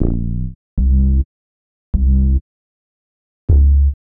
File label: Bass, Futurist Drum & Bass